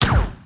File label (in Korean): 스포어익스플로젼